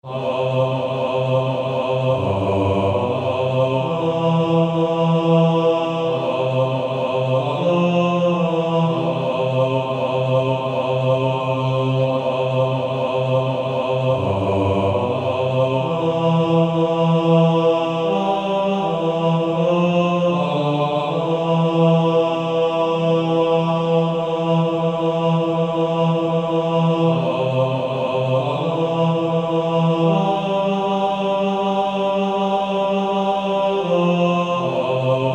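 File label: Male Choir, bass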